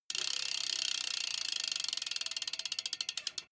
bmx bike freewheel push and slow down short

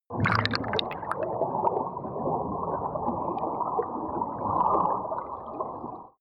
canal

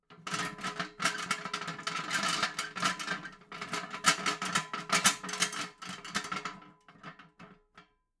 metal ladder small rattle

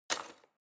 knife wood drawer place hard

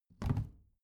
plastic trash can open regular

